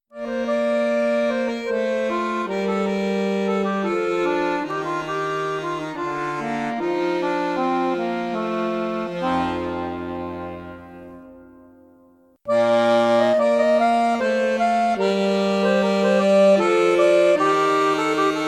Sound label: hornpipe in b